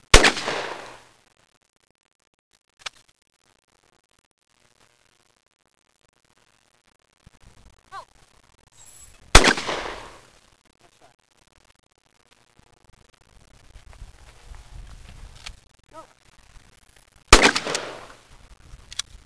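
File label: SHOTGUN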